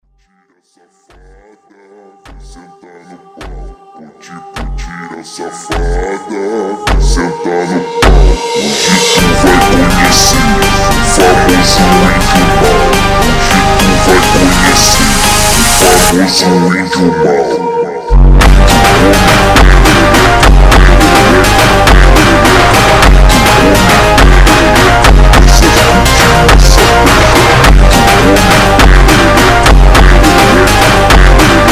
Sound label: Ultra Orquestra slowed